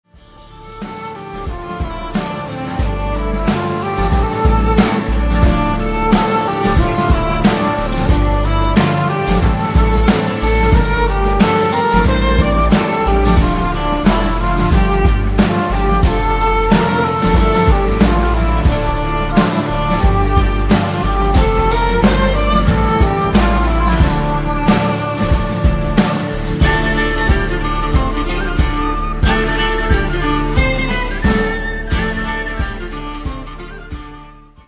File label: celtic instrumental worship